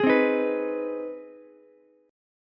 C Minor Major 7th Sharp 5th, Ukulele chord; CmM7#5 strummed; JGuitar

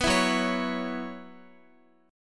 Gb 7th Suspended 4th Gb down Guitar, chord; Gb7sus4 strummed

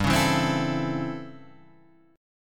A 7th Sharp 9th

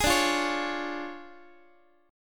D Minor Major 7th Flat 5th, D, down, Guitar; x,x,11,12,9,12; DmM7b5 strummed

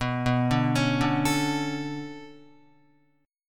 B-Minor 9th B down Guitar; Bm9; arpeggiated; JGuitar

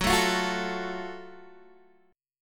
Gb Minor Major 7th Gb down Guitar DADGAD

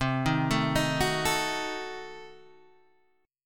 C Diminished 7th C down Guitar Open G

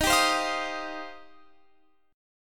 Eb 6th Add 9th, Guitar, Open F; strummed

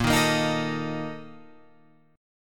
Bb Major 7th Suspended 4th down Guitar Open F; strummed; JGuitar

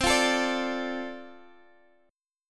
F 6th C, chord

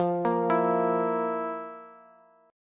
F Major 7th Suspended 4th, Bass; arpeggiated; JGuitar

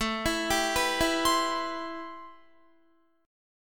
A-Minor 9th A Guitar; Am9; arpeggiated; 16,19,19,16,16,20

